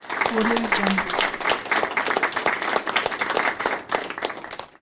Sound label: applause